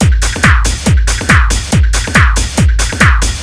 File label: ibiza D, Techno Drums; TechnoMusicMaker